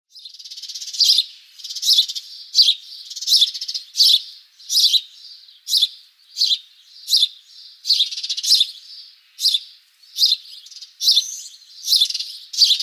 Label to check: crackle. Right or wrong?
Wrong